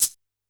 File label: Shaker, Standard Kit, Yamaha PSR-E323